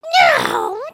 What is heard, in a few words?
collapse